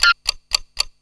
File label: final countdown; Spearhead Demo; MacFormat 2003 June